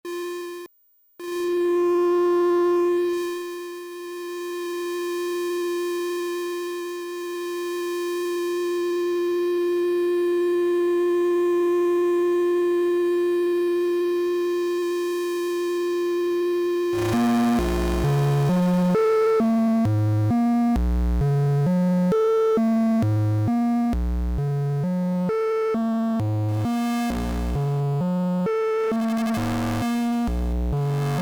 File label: Elmyra Seq1